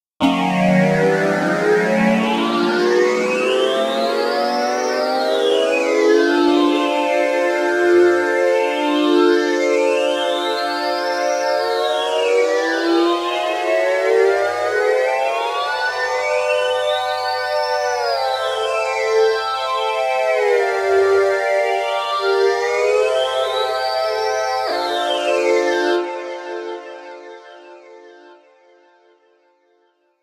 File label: String